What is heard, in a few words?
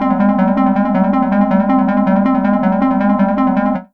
sequence C 160, trance; Trance Formation